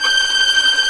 Vio Trem 16 G5; Multisamples; Digital Sound Factory Virtuoso

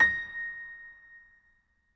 MF74 L, Acoustic Piano; InVision Interactive; Miroslav Vitous

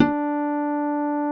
D3, SYNTH PLUCK; Music Store - Claudius Diemeter Schwerlos

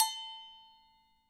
LOW AGOGO MF; Sonic Reality; Interactive Drum Kits

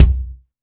Ludwig Kick, Drums; PX7; Digital Sound Factory Protean Drums

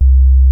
SINE-WOOF C2, ANALOG BASS; Music Store - Claudius Diemeter Schwerlos

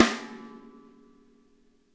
SNARE; Sonic Reality; Interactive Drum Kits; 90s sample cds

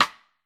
Vintage Snare, Snare Drum; Q Up Arts; Johnny.C's Vintage Snare Drum

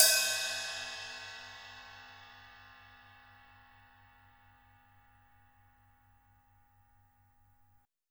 RIDE, Drums Acoustic; USB Soundscan vol.10; AKAI; ALL RIDE

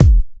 Kick, Kickdrum; Equipped Music - Loungin House

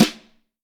Vintage Snare Drum; Q Up Arts - Johnny.C's Vintage Snare Drum

